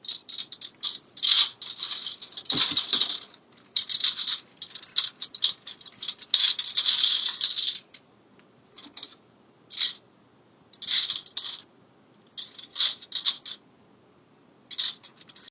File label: Fork Drawer